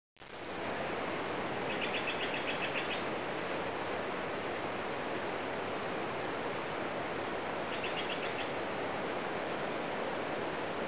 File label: birds; The Search for Ancient Wisdom